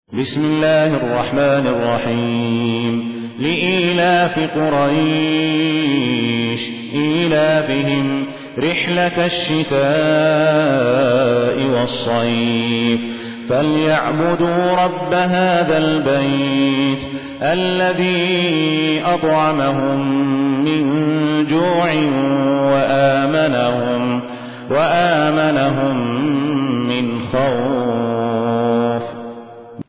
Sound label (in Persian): ترتیل; صالح الصاهود; سوره قریش